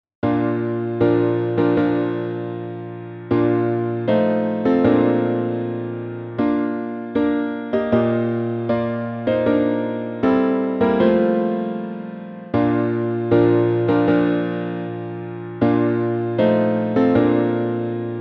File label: Bridal Chorus, tempo 78 Andante; from Lohengrin